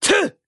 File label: attack